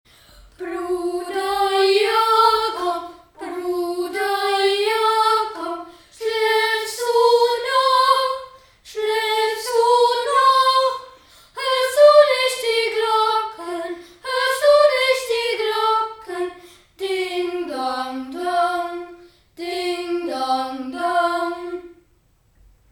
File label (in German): Bruder Jakob Chor